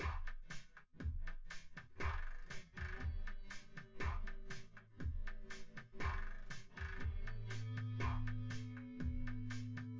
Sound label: wdrums synthconv